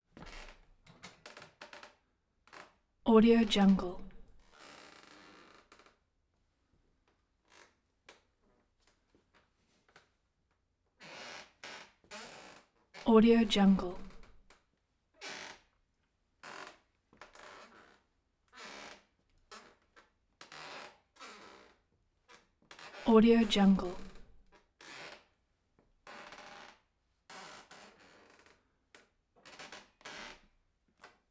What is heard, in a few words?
wood threshold squeek